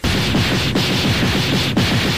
rapid punches; dbz

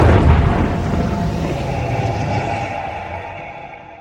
end portal opening activation; minecraft

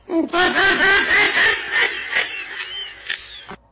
muahahahahahahajahaahh

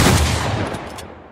pump shotgun; fortnite

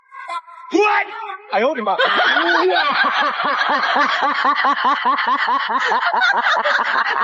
laugh remix

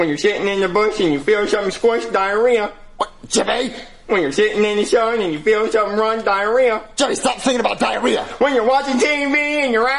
diarrhea song; jeffy

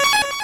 savepoint; undertale

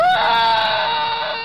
falling from waterfall scream

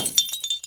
glass ball broken

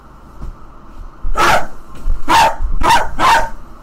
bark; ishowspeed

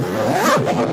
zipper sound

